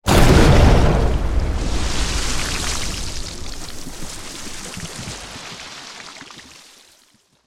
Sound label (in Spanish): explosion de agua